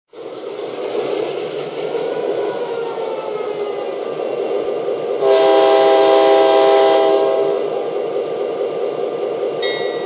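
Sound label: GP9, locomotive; dti; ple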